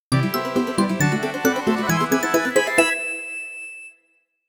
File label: Bonus Score; Abracadaball; Android